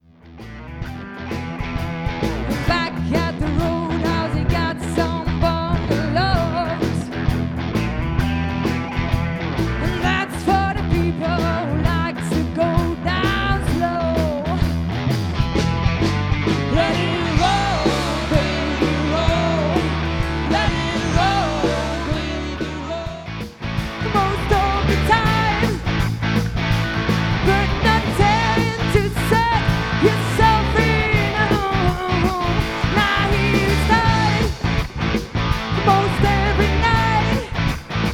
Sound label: smokey & bluesy